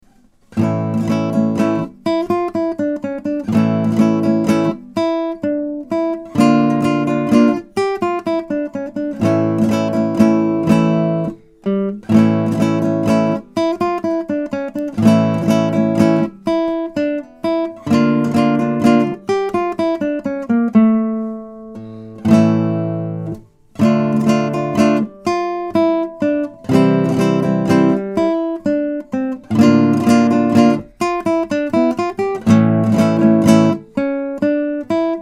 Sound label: Flamenco tipico